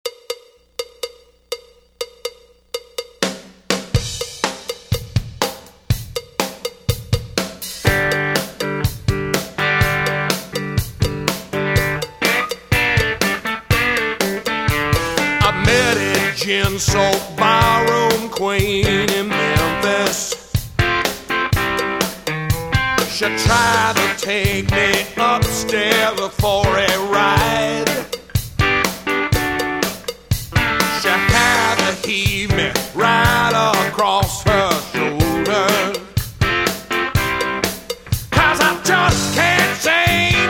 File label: Cover Band, classic rock; Indianapolis